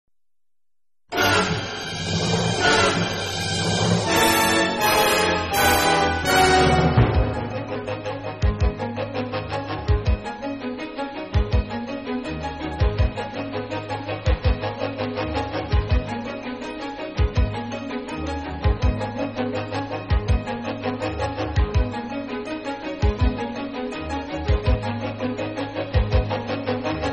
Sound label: Music Battle; Fairytale Fights; PS3; Xbox 360